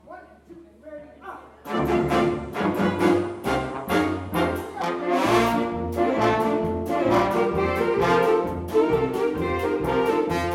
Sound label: Polka